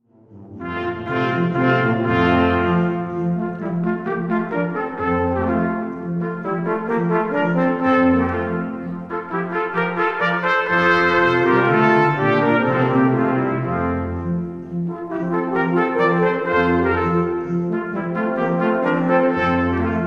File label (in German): Sinfonie fuer Blaeser F-Dur Allegro